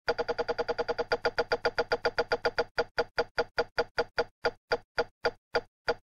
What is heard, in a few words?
spin Tick